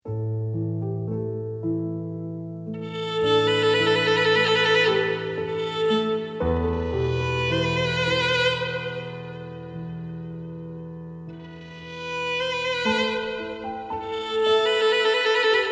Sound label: violin trill